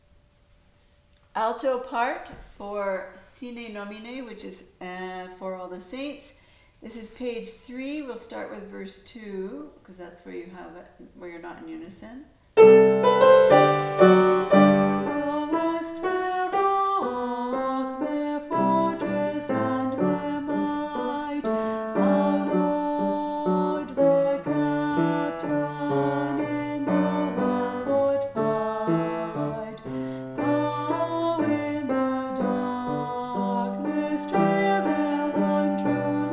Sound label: Alto; Sine Nomine; Saints